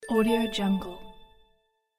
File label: quiz correct